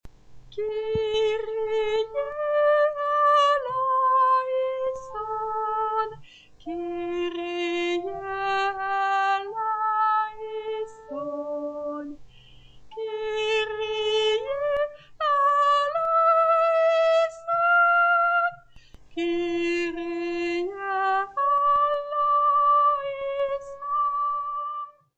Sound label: Soprano, Chorale